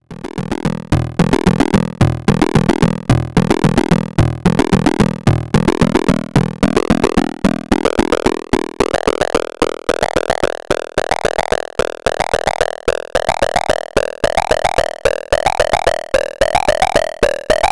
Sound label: Morphing Groove; Body Synths Metal Fetishist